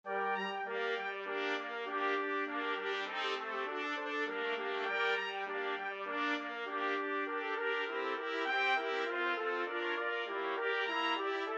bach badinerie trumpet quartet

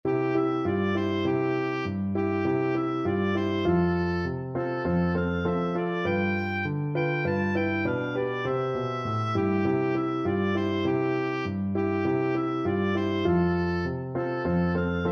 jingle bells, oboe

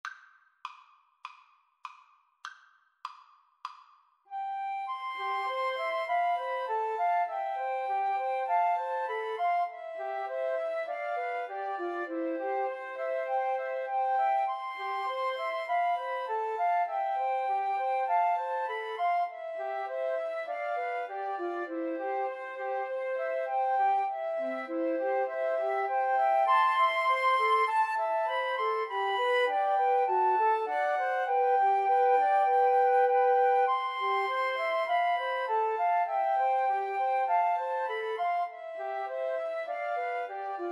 Flute Trio

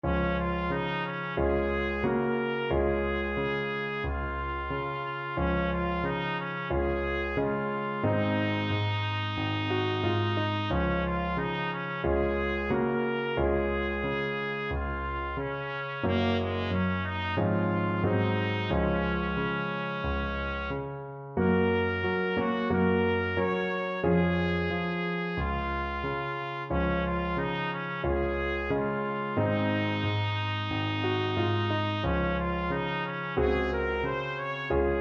trumpet

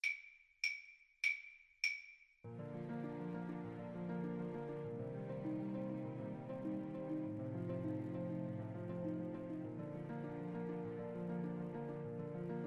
French Horn

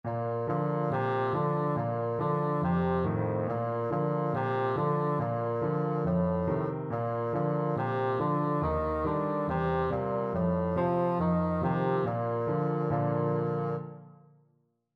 yankee doodle, bassoon

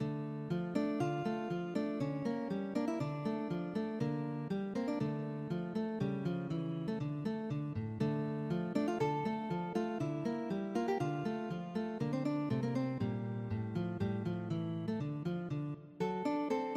hungarian dance, guitar

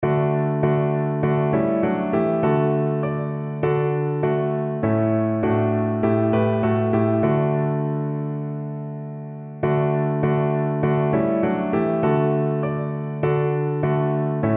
savior like a shepherd piano

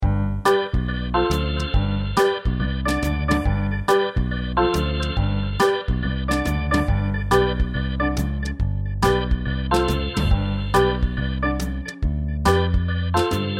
viola

